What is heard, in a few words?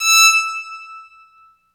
BOWED PSA, Bowed Psaltry